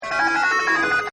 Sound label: Game SFX, Arcades; Backgrounds